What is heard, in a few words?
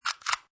Lock